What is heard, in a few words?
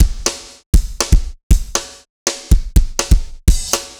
dance floor tight 120, loops